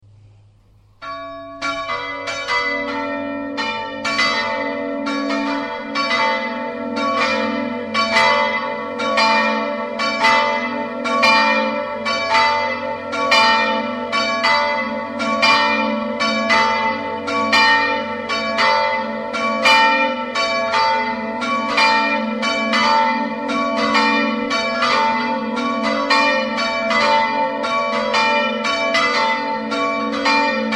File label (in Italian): campolonghetto, campane